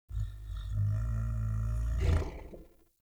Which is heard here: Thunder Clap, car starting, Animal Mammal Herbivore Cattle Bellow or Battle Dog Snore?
Battle Dog Snore